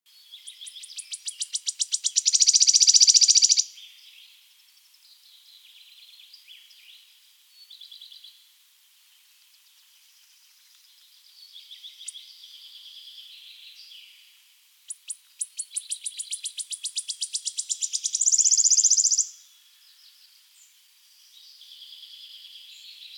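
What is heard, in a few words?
wood warbler voice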